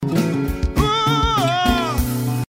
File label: uuuuuuuahhhhh